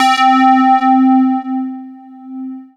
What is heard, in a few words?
Dance Floor Bass, Multisample Basses